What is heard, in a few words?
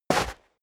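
step dress boots sand jump, footsteps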